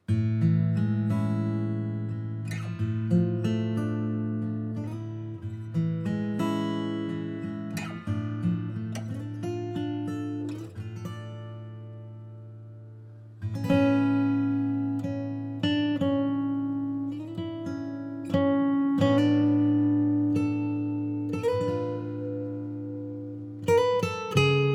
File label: Guitar version